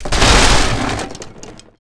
toilet; World of Tanks Blitz

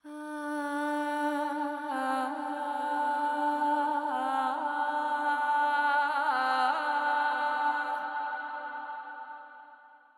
music interactive; World of Tanks

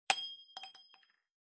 shells 20mm bolt; tanks; War Thunder

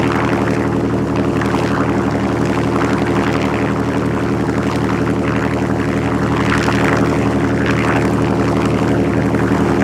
mustang rear, engines; War Thunder

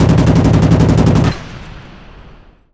plane; World of Tanks

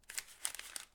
map use; Escape from Tarkov

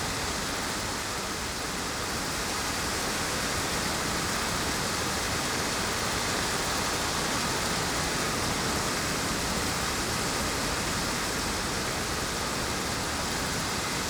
ambience desert forest night breeze rainy; Rust

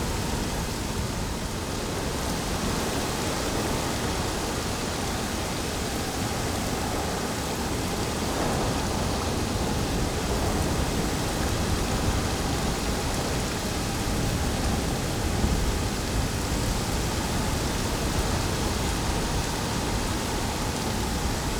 ambience beach day windy rainy; Rust

